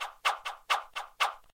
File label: hammer; smbx38a; The Great SMBX 38A Contest I; Blossom Whisper